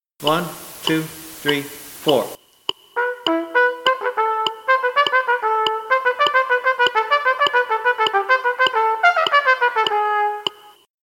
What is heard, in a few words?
Play-along samples, first phrase, qn=100; Cleopatra's Lament; trumpet